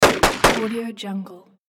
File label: cartoon gun shots